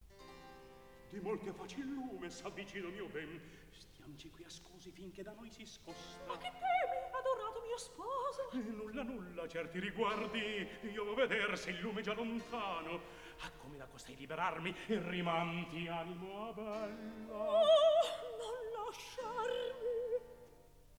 Philharmonia Orchestra Chorus

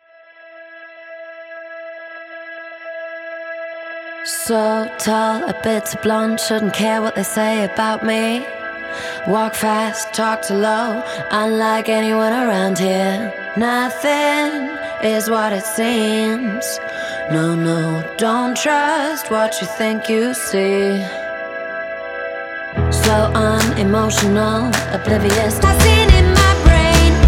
Live Acoustic

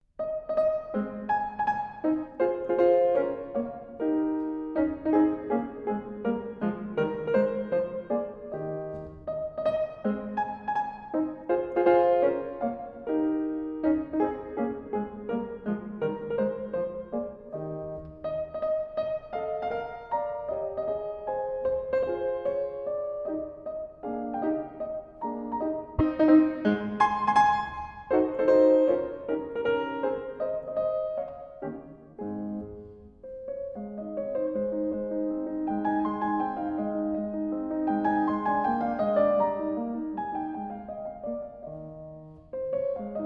Keyboard Sonata in A Flat Major Menuetto Trio

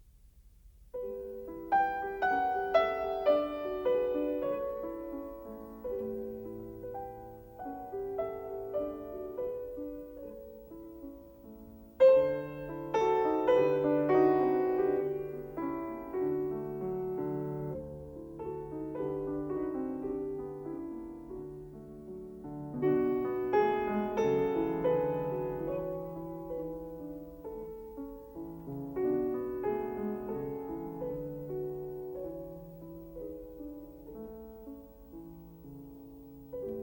Piano

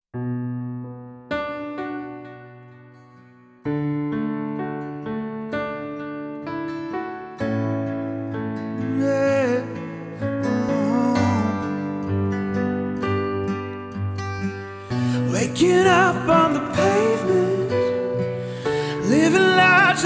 Acoustic

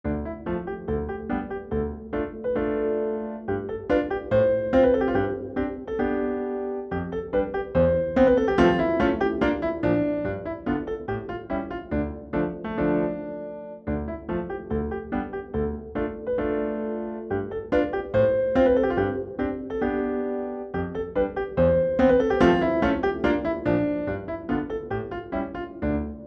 Romanian Folk Dances

